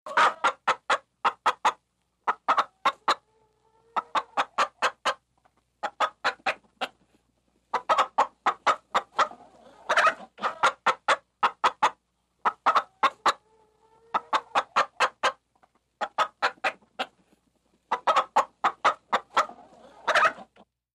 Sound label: Hen Agitated, chickens